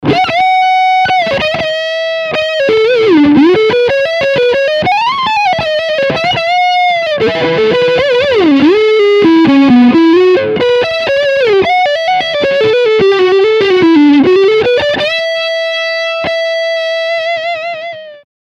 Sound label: Lick Overdrive Front SC